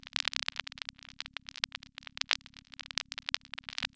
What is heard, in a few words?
crackles, Vinyl; Misc Percussion